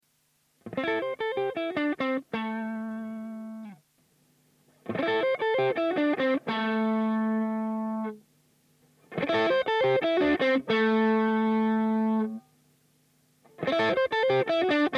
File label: bbp comp single